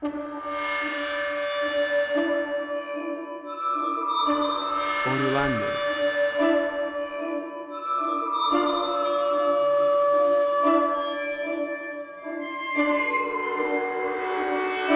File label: Big Epic Horror Action Film Music